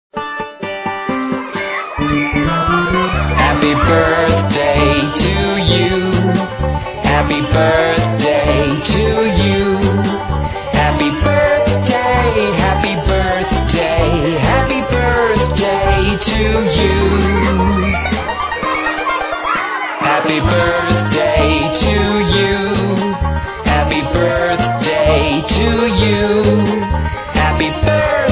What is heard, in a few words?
Happy Birthday Song Happy Birthday